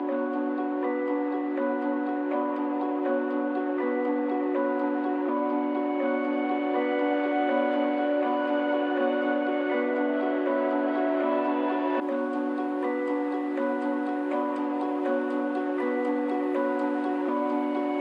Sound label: Night 160 bpm